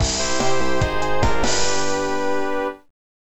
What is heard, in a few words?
Match Start; Moujiya; arcade